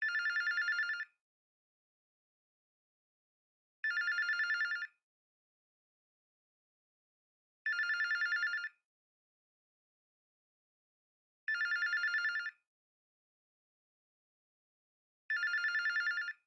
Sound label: Hotel Phone Ringing With Second Phone Ringing Nearby